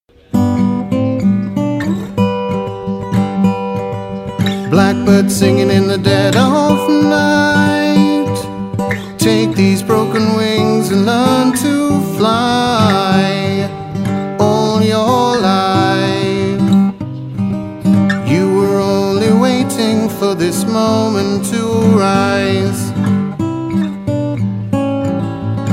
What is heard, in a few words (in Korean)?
영국남 노래; 남자